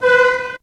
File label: CAR FX